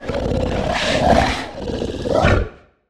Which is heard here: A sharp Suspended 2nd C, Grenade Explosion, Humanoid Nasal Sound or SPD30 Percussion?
Humanoid Nasal Sound